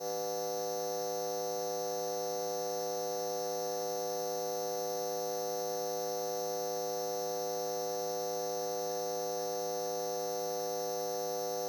Lamps Designed; aXLsound; becquerel

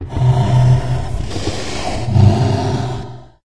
hydra 3 lung breathe loop; hl2

